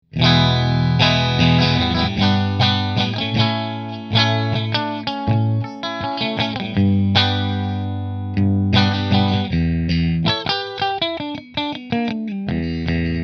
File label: Position 1 Fender; Ranger